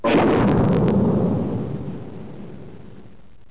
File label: MISSILE; ARCADE